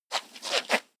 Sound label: goat snort